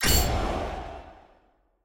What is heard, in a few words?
countdown